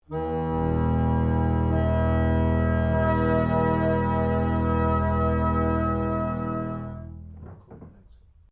ORGAN PUMP PRINCIPLE E2 OCTAVES CLUSTER, Old portable church organ; Keyboards; Musical